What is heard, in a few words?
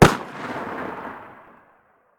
deagle muzzle mono far